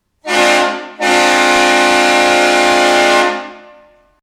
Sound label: Locomotive, horn sounds